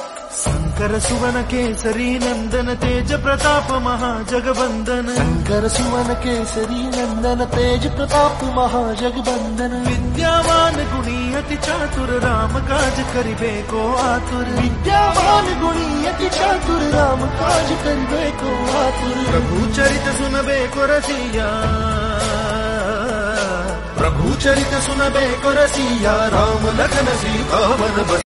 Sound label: Hanuman Chalisa Ringtone